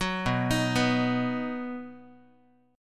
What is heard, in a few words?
B Major, Guitar, 4A,4E,5A,5D,5G sharp,6C sharp; arpeggiated; 9,7,6,9,x,x; JGuitar